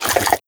breath out, headcrab black; creatures

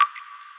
drip, ambient weather

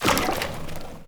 paint blob splat; Portal 2

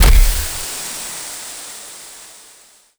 controls steam; combine plug

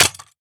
weapon smg impact; physics

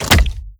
shut, zombie blind, jaws; Half Life Alyx; creatures

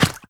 scuff, footsteps, concrete flooded

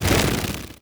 buzz soft, antlion wings

